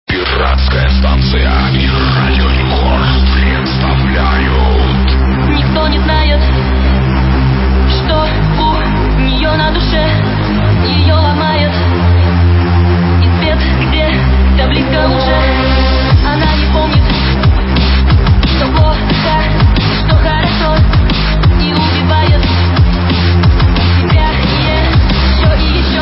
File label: Vocal Drum n Bass